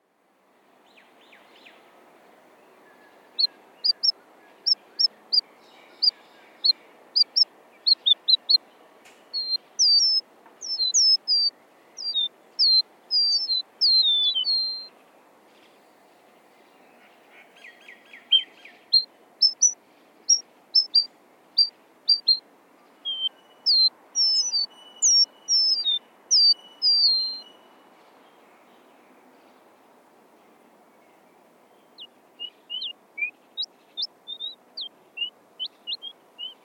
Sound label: callnotes radio mix